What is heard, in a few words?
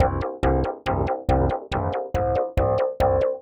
synth 140 fltchops C; Transmission-X